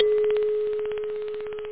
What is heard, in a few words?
high bell; da capo vol1; drums